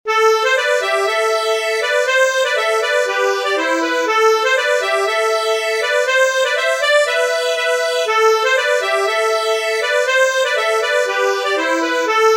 bourree; long chemin

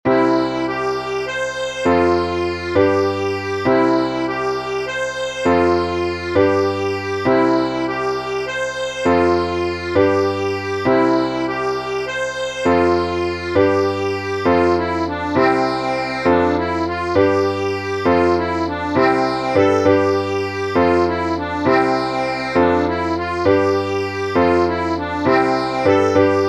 Basque Fandango Bourree

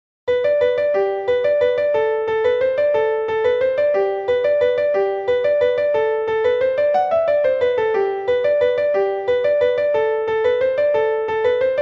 mazurka

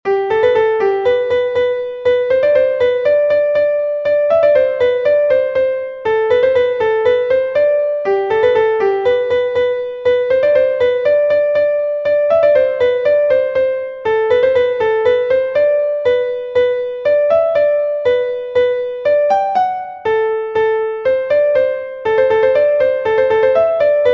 Polka; d'Uzeste

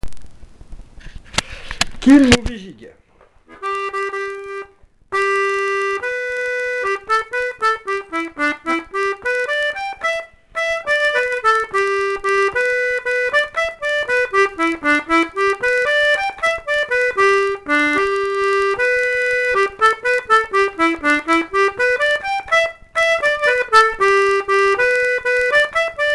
jig

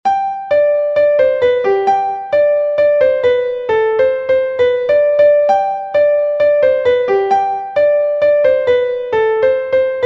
Bourree valse; Le Porcher

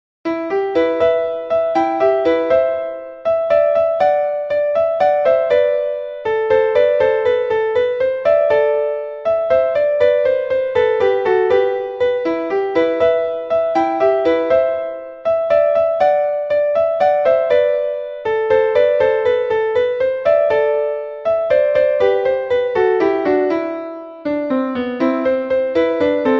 mazurka; Salou

